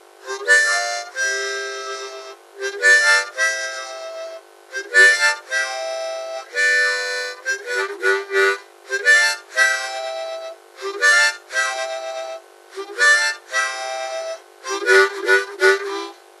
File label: harmonica